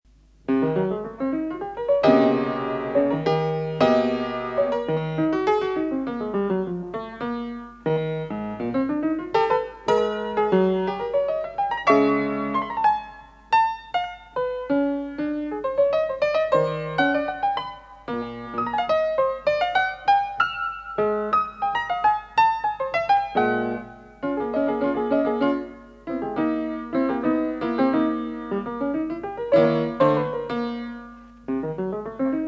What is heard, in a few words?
solo piano